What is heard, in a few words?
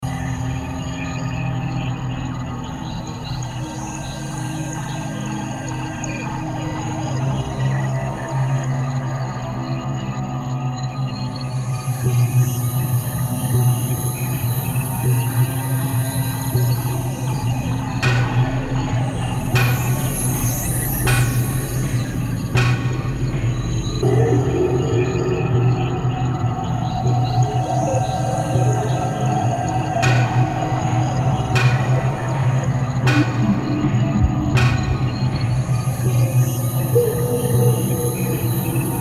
Horror